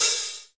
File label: prominent open hat c sharp key